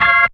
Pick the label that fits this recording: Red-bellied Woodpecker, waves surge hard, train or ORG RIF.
ORG RIF